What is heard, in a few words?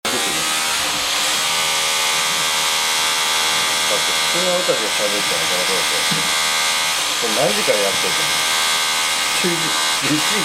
noise